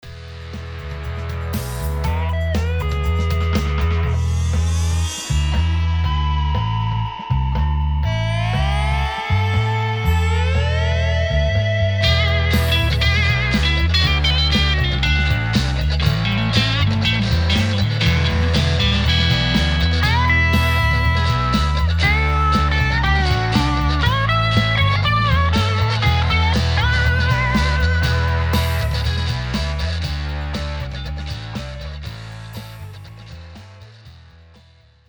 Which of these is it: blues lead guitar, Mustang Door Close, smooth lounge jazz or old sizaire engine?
blues lead guitar